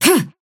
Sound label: effort dash